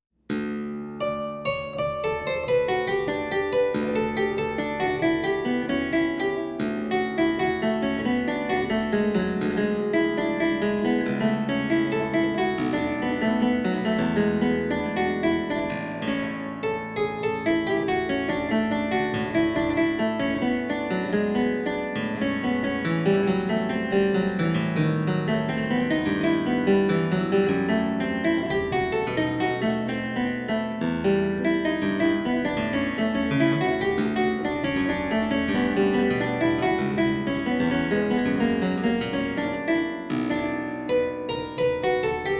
for Lute in E flat major; Prelude, Fugue Allegro; Johann Sebastian Bach

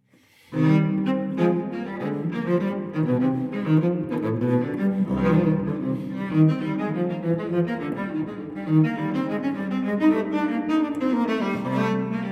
Cello Suite No. 5 in C minor, Gavottes I II; BWV1011; Johann Sebastian Bach

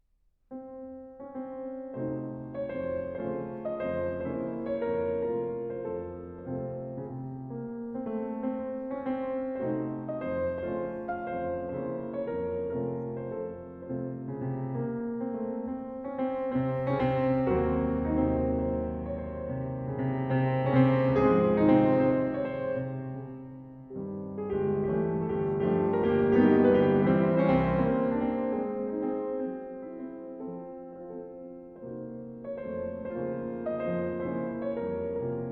Preludes Op. 32 No. 2 in B-Flat Minor

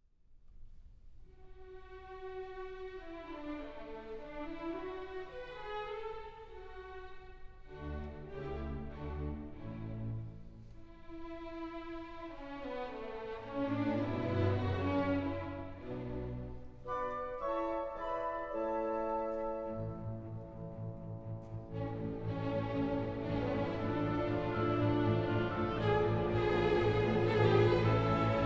Violin Concerto in D major, Allegro moderato

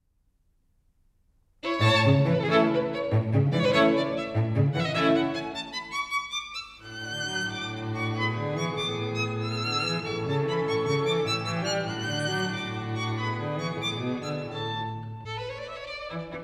String Quartet in A Major Allegro; String Quartet No. 5; Op. 18 No. 5; Ludwig van Beethoven; Beethoven - String Quartets Nos. 1-16

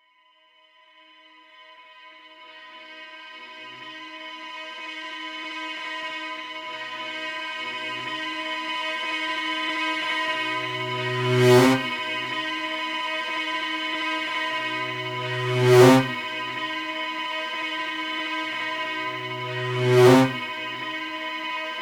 Partial Loop And Solo

